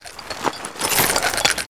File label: plant; Call of Duty Modern Warfare